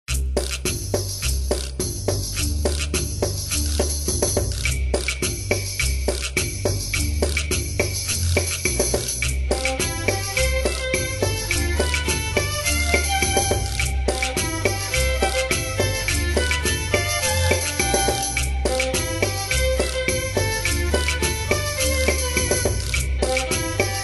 Bazaar; Aveyond Lord of Twilight

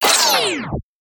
Early Victory; boomerang fu

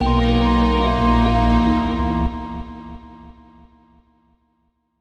Retry Jingle; Windows

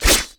Weapon Sword Attack; Inotia 3

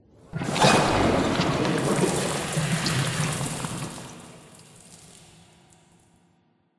plastic pipe spill

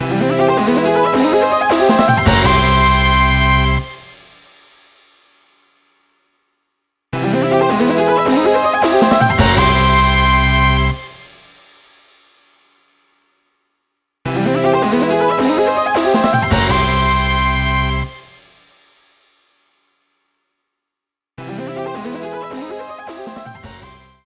Item Won; Sega Superstars Tennis

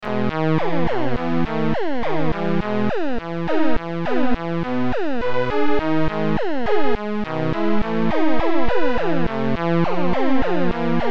Beepola; hylics gamerip pc 2015

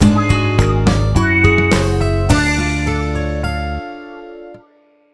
Triple Bogey Jingle; Fun Fun Minigolf; 3DS; Wii

